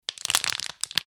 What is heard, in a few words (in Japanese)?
骨折